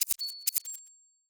Start Select, UI, mechanical, percussive, ding, shudder, hold release